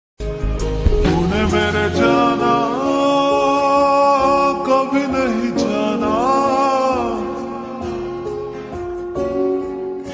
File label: Ringtone slowed and reverb